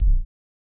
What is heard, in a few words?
Long Kick C; SoundChills